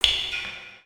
Drip; ninjago

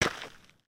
Foot Dirt Sneaker Walk, footsteps

